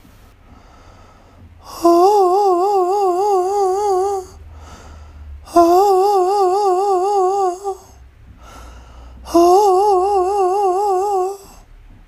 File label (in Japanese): ファルセットでの音程移動